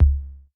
KICK, Sound-Master SM-8

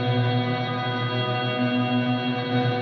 Lap Clean FX 170 B, guitar drone

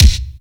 KICK, GATE KICK

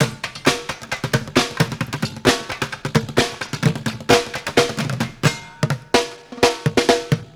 Street Groove, Ambient Grooves

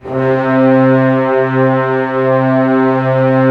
orchestra C2 FF; Sound On Sound - Peter Siedleczek's Orchestra

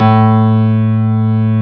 KLAVINS A2; East West - Global Sounds

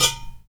UDU, African

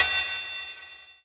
One Shot Ride, Drum N Bass - Percussion; Sonic Foundry (Sony Creative Software); Knutrix Drum & Bass

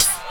HIHAT HIP; Best Service Dance Mega Drums

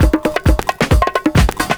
PERCS, 70 s Breakbeats

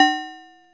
SYNTH BELL; Zero-G; Ambient 1

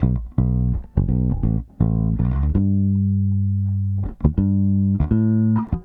Soul Bass, 70s Analog Funk

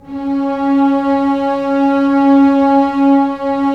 STR SEC, Roland LA Composer, Sec Mute St; Roland - LA Composer vol. 1

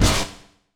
HIT 4 FX; Bolder Sounds - Granular

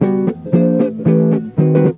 EGUIT BREAKS; Best Service; World Colours

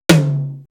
Tom, Drum One Shots; Coldcut's Kleptomania VOL1

